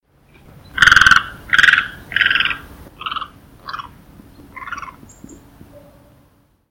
Great Egret, Ardea alba; wading largewater

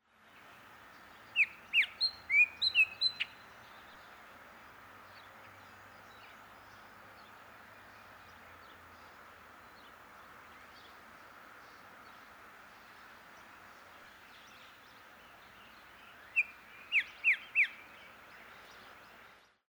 Bullocks Oriole, Icterus bullockii; blackbirds orioles

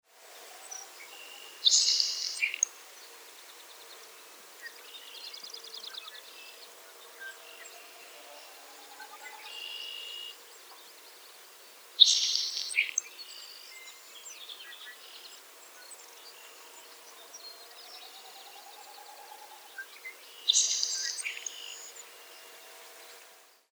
Nelson's Sparrow Ammodramus nelsoni; sparrows

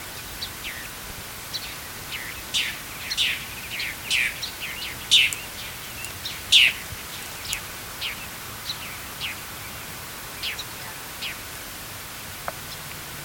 Northern Mockingbird Mimus polyglottos; thrushes thrashers

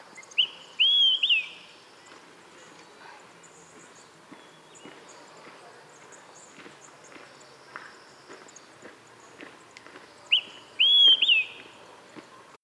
Olive-sided Flycatcher, Contopus cooperi; flycatchers

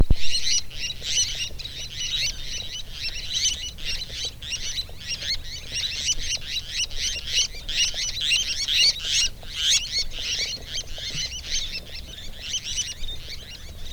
Least Tern, Sternula antillarum browni; gulls terns

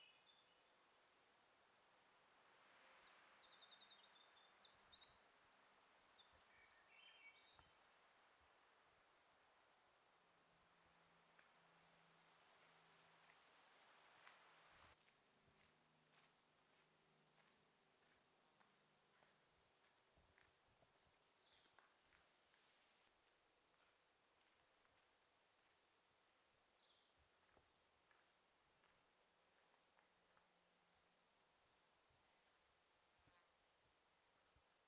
Blackpoll Warbler Setophaga striata

